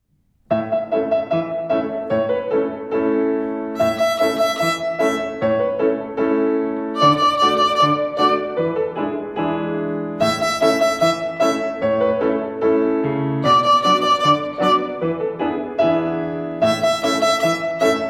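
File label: Rhythm on E and 1, Violin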